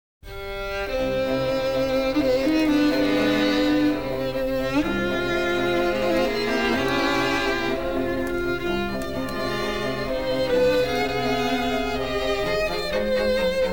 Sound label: strings; beethoven